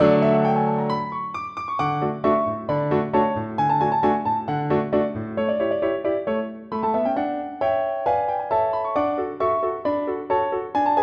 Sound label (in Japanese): コミカルなピアノ